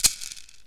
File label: AFRICAN INST; OMI - Universe of Sounds